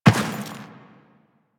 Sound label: Step Dirt, Footsteps; Enemies; Warframe; Teshin; DE